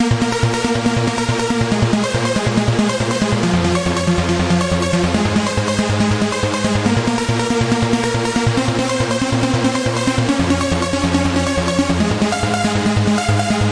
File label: 140 trance arp lead; SYNTH AND ELECTRONIC LOOPS